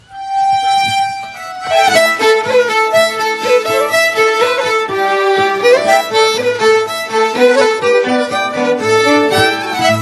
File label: playing the Irish fiddle; Jeremy's performance at the Baltimore Fiddle Fair